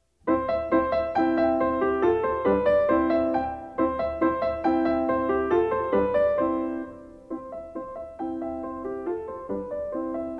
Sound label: Ecossaise; J.N. Hummel